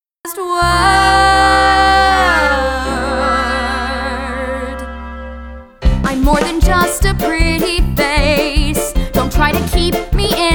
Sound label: Guided Vocals